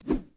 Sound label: whoosh